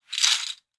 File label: clear Bets, casino